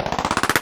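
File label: gun roll right; Spectrasonic Distorted Reality 1; BIZZAROS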